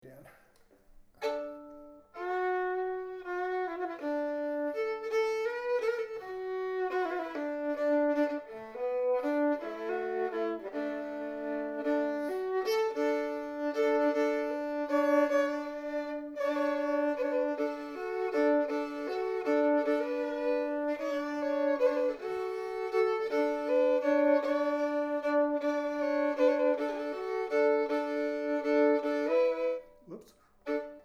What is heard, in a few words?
Lake Ponchatrain fiddle